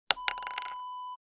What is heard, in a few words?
bottle cap drop